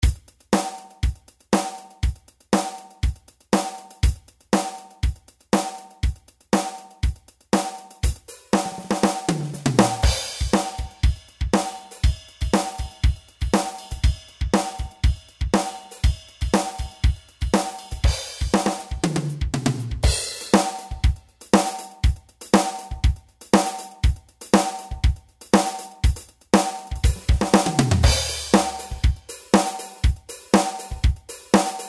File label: DR-770 16th Note Feel